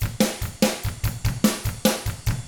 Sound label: rock 6/8, drums; ILIO; Double Platinum Drums 1